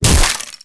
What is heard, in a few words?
back stap